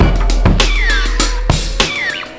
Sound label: 100 bpm, breaks; Zero-G Beneath the Planet of the Breaks